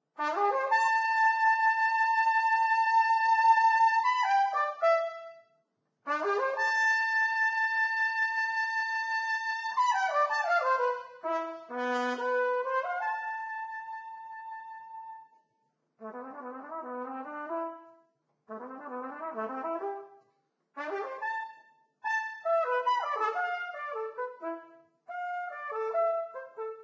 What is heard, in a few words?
Trumpet; Zomboid; Lifestyle